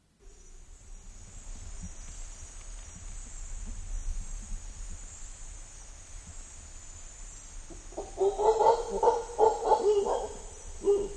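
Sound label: mantled howler monkey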